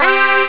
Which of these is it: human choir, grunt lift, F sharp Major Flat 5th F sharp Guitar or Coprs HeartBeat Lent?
human choir